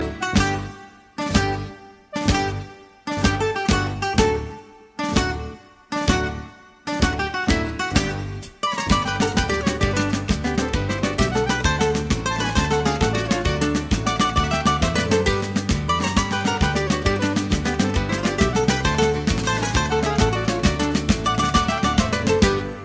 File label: mexican hat dance